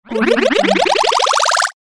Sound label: robot power up